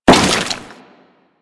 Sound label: Crewman Step Goo, Footsteps; Warframe; Corpus